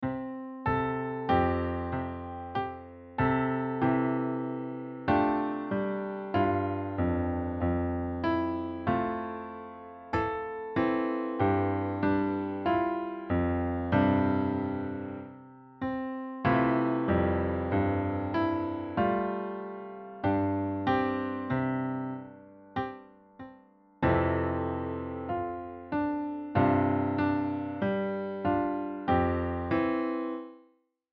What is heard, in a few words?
Twinkle layered; Generated Pieces; Classical Music Composition Using State Space Models